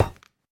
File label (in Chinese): 下界合金踩踏声